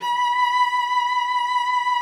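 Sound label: B5, cello vibrato; Xsample VOL-7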